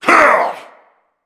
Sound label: shout